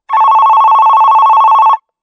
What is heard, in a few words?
kazusa ichinomiya 1 bannsenn